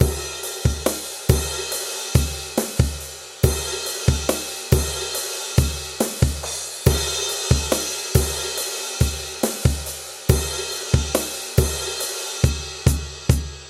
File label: drums mix